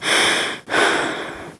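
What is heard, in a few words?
Realistic Fatigue Voice, female; oblivion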